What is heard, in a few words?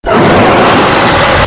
octopus roar; octogeddon